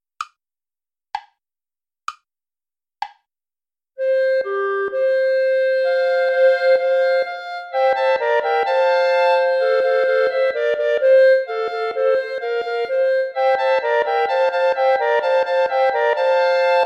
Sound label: psallite unigenito play along without bass clarinet; praetorius michael